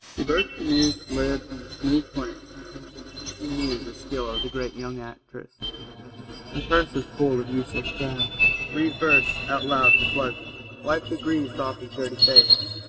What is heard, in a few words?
birds